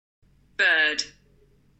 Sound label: bird